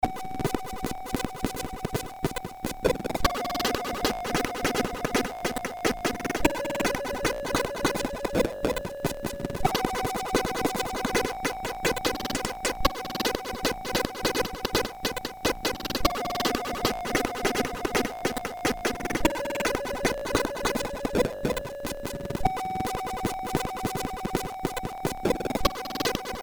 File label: sid, MOS6581R4; hvsc